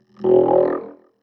Neo-Wave Vocoder; Pop Rock; Pro Tools Essential Demos; Digidesign; Essential Loop Demos; Demo Session - Pop Rock

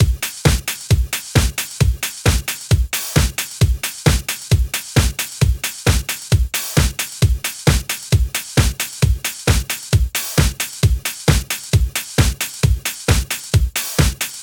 133 House Beat; Dance DJ; Drums; House Beats; Pro Tools Essential Loops; Digidesign

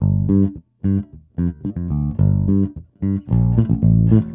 110 Pop 70s Bass Gmin; Pop Rock; E-Bass; Pro Tools Essential Loops; Digidesign

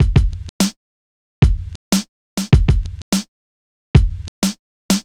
Hip Hop Beat, Drums

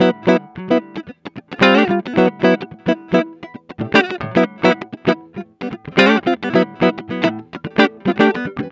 110 NuJazz Guitar Emaj, Jazz Guitar Loops; Pro Tools Essential Loops; Digidesign

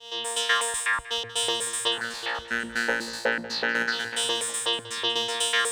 126 Garage Synth Vocal Bbmin; Dance; Pulsing; Digidesign; Pro Tools Essential Loops

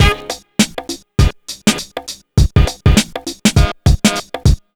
HipHop Brass Combo Db maj; Digidesign; Pro Tools Essential Loops

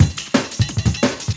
175 D&B Drums; Pro Tools Essential Loops; Dance DJ; Drum n Bass

